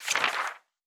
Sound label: Book Page; Fantasy Interface; Fantasy Sounds Bundle